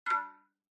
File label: OS Sound (Speaker-Notification)